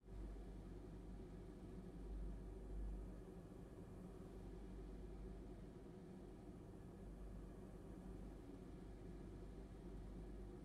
Ambience, Roomtone, Quite Room, Air Conditioning Hum & Hiss; Surround; Sonik Sound Library; Spatial Roomtones & Interiors